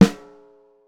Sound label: Open